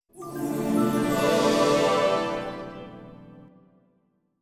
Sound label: puzzle solved